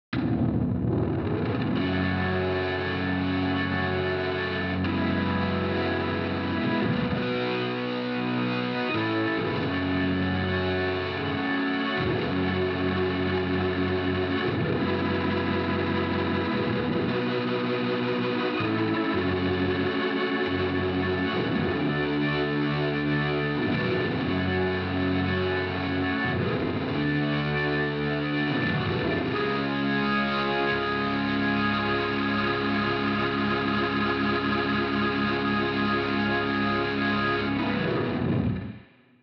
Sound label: Hammond Burn